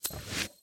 sym travel; serengeti kings

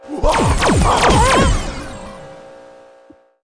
win; space wars

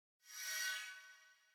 firefly; fireflies

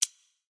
button Up; templeofnudges

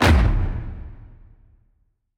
hit; dead or alive 2

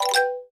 attention Sound; eldorado

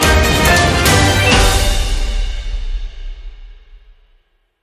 free Spin Bronze; football

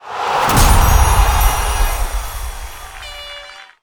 win big win start; superstriker

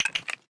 symbol Stop On Stone; magic maid cafe

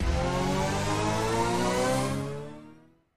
respin snd; king of slots

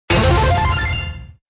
respin Win Coins; spacewars

